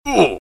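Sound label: death and pain sounds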